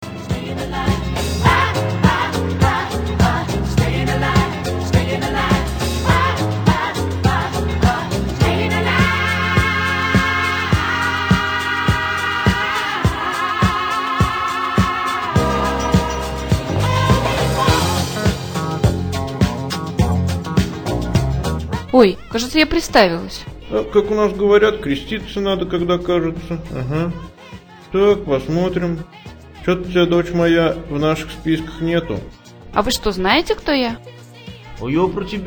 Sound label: Carcrash